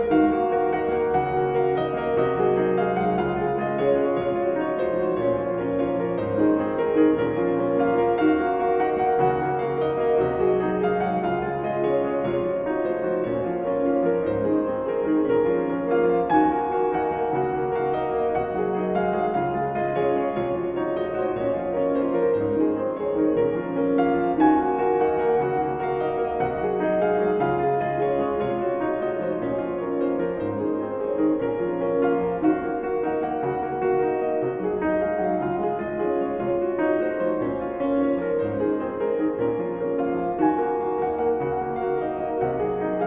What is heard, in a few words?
Canto Ostinato, Minimal Piano